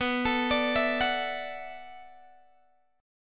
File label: B Minor 11th B down Guitar Open G